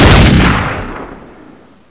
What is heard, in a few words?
barrel